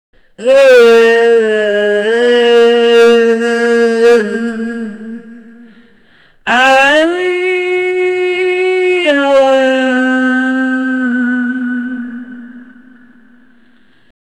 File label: sad vocal yeeah